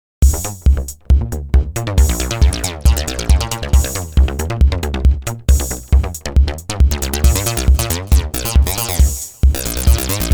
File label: FM Bassline